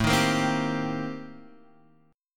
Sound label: C Minor 7th A; Cm7/A strummed; JGuitar